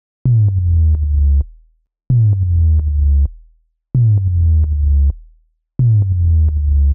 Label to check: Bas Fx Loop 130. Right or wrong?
Right